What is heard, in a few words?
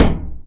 PUNCH, Anime Sound FX; AKAI Anime Sound FX